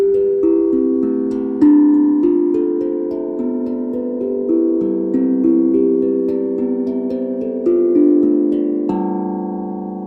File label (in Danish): Stor tromme